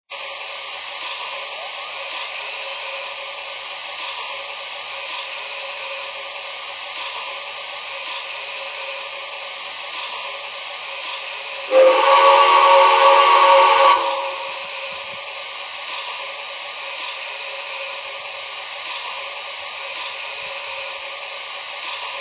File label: j1e, trains; 5330; NYC